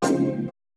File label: perc, Roland MC-909